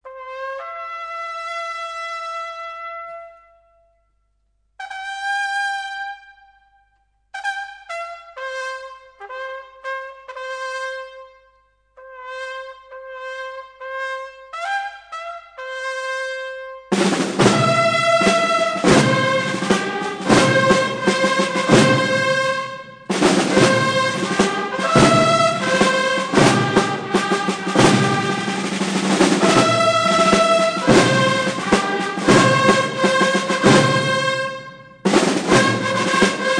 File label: Marcha Real